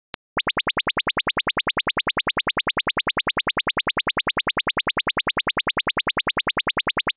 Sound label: chirp 16000